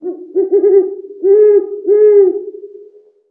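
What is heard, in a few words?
animal owl distant; ModernWarfare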